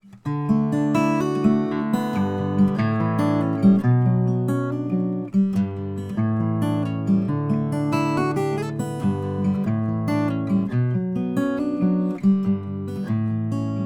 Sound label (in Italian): Warm Arpeggio Acustica; 2.2 in configurazione warm; amplificatore BBQ 2-2